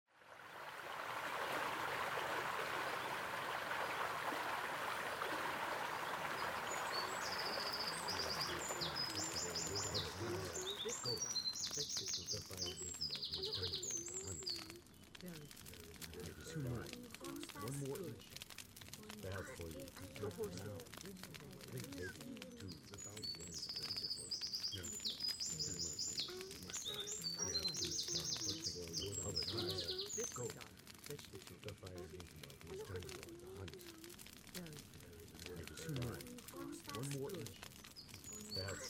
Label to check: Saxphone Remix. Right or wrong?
Wrong